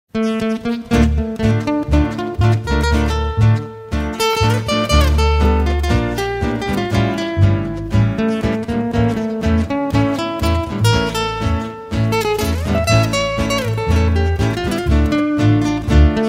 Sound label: South American Sound